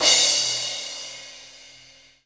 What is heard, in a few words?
Cymbals